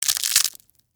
Crunch Ramen Noodles

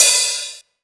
Cymbal

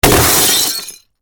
Crash Box Drop

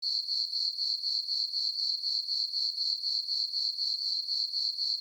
Crickets Chirp

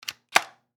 ArcadeGameGun

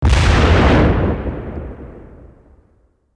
Explosion Mortar

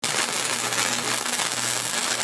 Electric Current

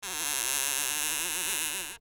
Door Squeak Wood

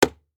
Dart Hard Hit Board

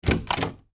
Door Open

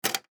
Arcade Game Coin

